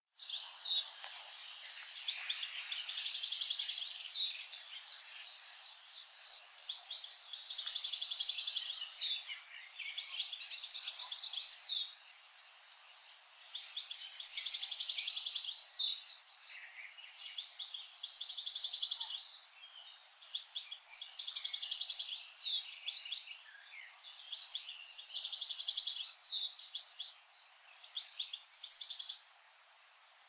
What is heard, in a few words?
Carduelis carduelis; SETE